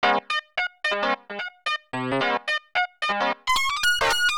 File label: fm rhodes